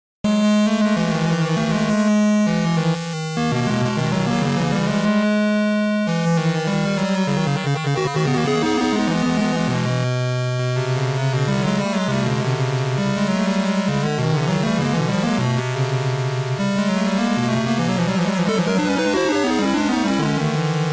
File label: Dungeon; u3-atari